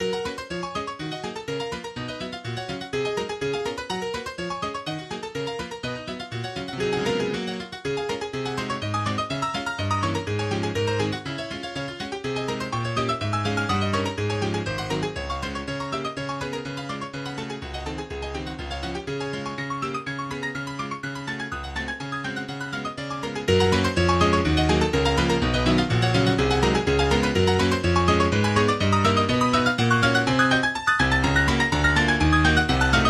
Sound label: Etude in G flat major Allegro vivace; Etude No21; Butterfly; Opus 25 No9